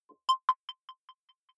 button echo distorted high

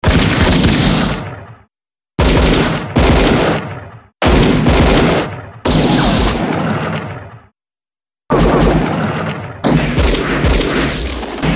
shot seq

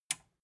metal button tiny release enable

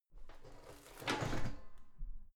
garbage can open lid soft

